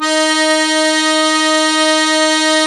d#5-a7, Accordion

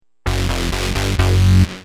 Heavy, Bass; Industrial

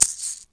MARACAS; East West - Global Sounds; AFR.BRAZ

